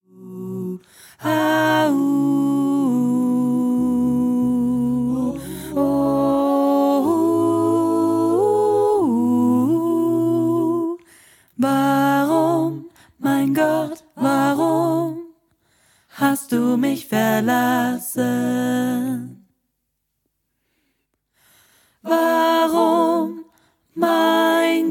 Lern Alt

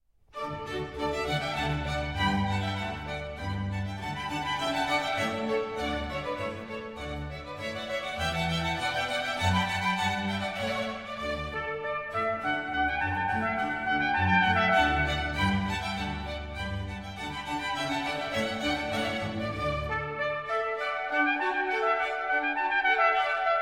Allegro aus dem Trompetenkonzert D-Dur

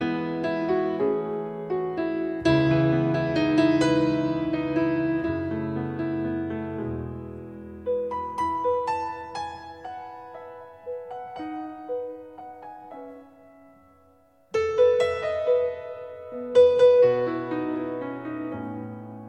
Choralimprovisation

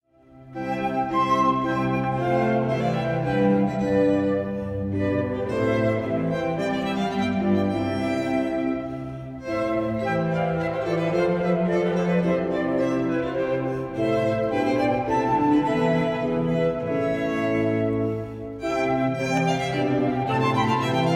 Chor

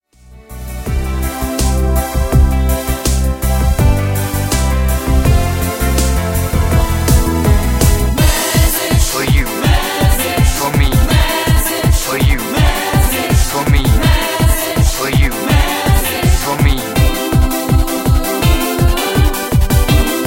Message mit Backings

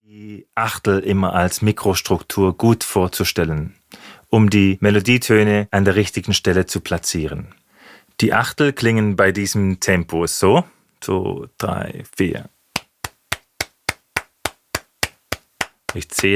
Rhythmus

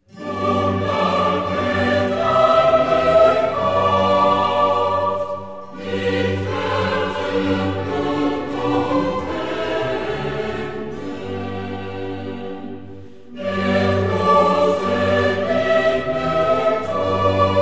Chor

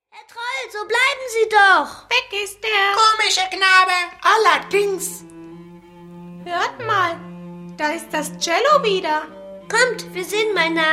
Das Cello

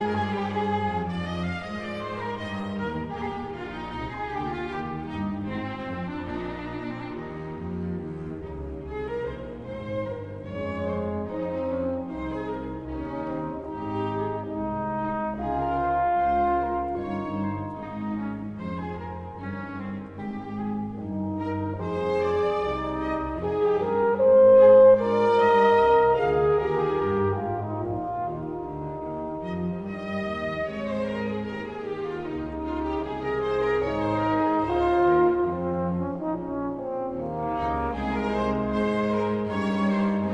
Hornkonzert; Wolfgang Amadeus Mozart

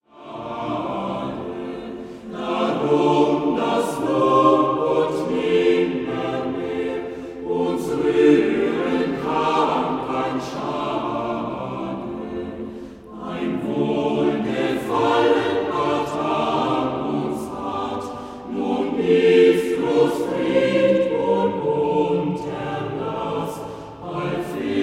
Collegium vocale, Blaeserquartett, Chor

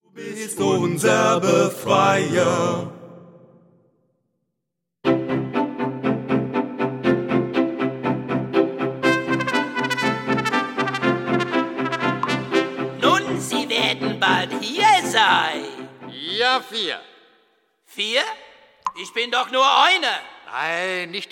Acapella Maennerchor Refrain

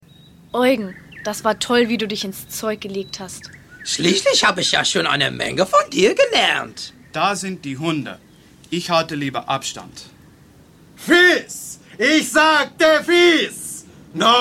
Die Hunde-Chorprobe

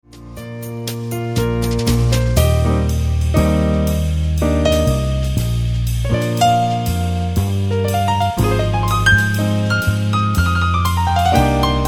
Sinfonia in Eb-Dur

